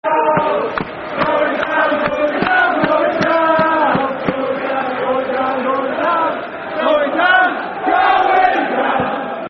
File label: Going Down, Football Chants (Soccer Songs); Liverpool Lfc